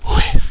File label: whiff; python-pygame-doc